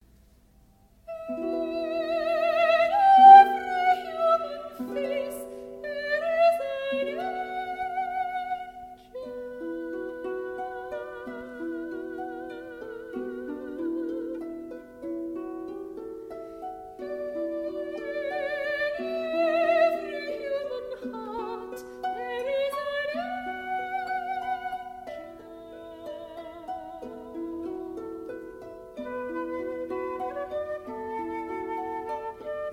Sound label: Songs of the Mysterious (harp)